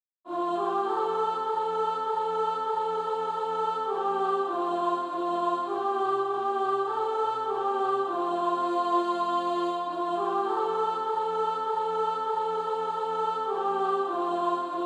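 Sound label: soprano, SATB Practise Music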